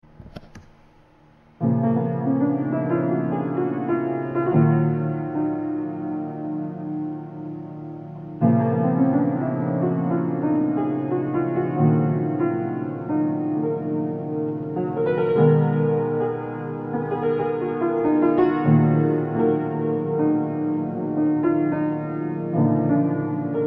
piano concerto practice

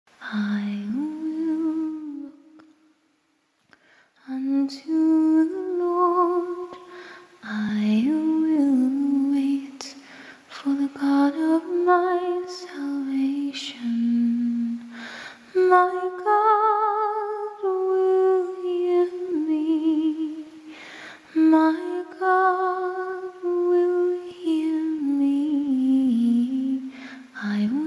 acapella scripture

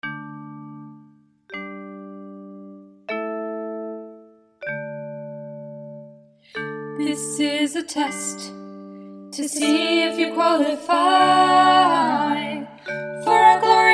jingle

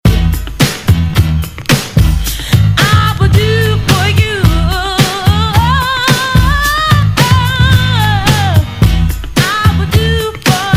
music beat commercial break

